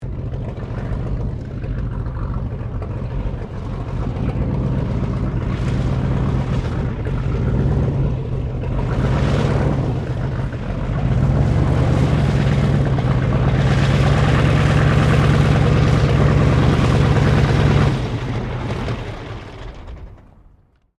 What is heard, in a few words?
1916 Sopwith Pup replica taxi shutdown, airplane; Airplanes; Vehicles